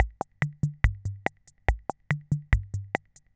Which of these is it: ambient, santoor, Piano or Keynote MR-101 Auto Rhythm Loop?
Keynote MR-101 Auto Rhythm Loop